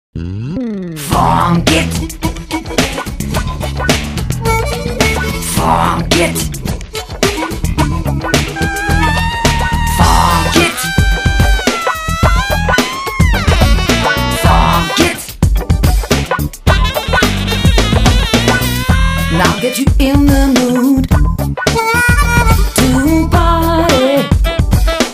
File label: Funk